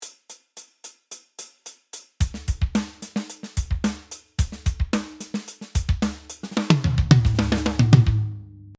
HH Close

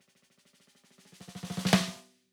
Snare roll Edge hit

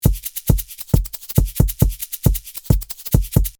PlayBeat

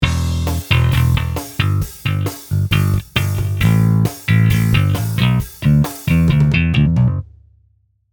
Bass; Impact Soundworks

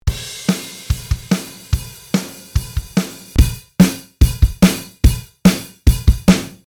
drum

